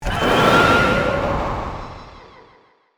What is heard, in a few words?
Tormentor Die